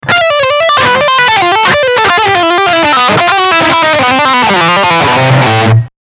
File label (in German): Lick Distortion Steg PU